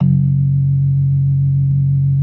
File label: B3 F#2; Best Service; Hyper Dance